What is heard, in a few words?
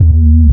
bass